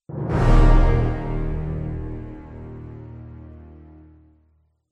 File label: Stinger Victory; Warehouse